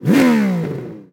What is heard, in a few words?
car Rev Oct Up; hotline2